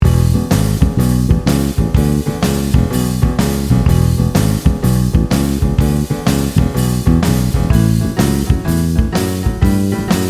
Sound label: Walkdown